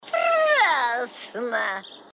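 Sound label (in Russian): прелестно сорока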